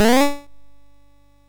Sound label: Moon Hop, sid, MOS6581R3